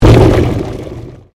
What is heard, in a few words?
Convolution Pulse